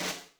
snaredrum, Yamaha RM 50

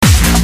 supa bass with hihat

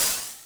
CYMBAL, Yamaha RM 50

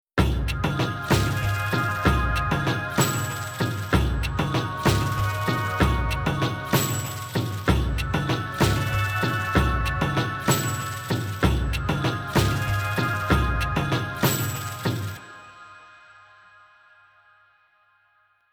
Tribal background music; Nokia Ringtones; Audio themes

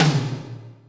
Yamaha RM 50 Toms

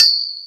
Pole, Korg M1; Drum Samples

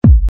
kick

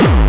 hardcore bass

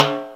Timbale, Yamaha TG-33; Drum Samples

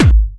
Medium, kicks

